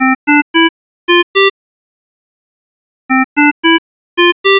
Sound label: temp hi, alarms